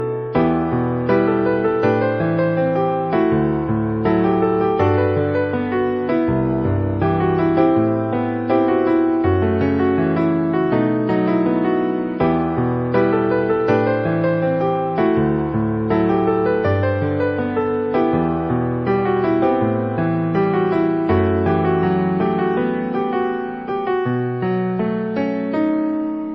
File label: piano intro; iphone